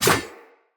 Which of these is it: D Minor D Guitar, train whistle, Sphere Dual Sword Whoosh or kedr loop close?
Sphere Dual Sword Whoosh